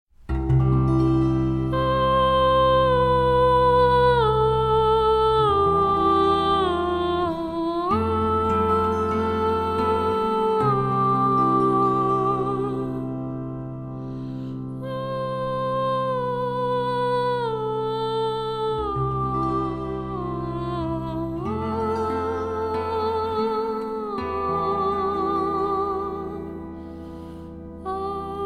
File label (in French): Choral, cithare